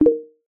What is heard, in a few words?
module enable; minecraft